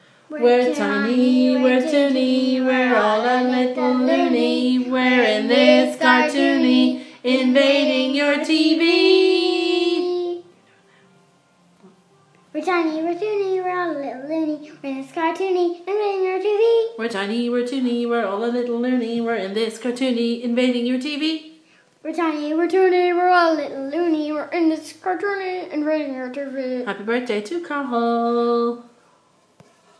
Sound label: birthday boo; animaniacs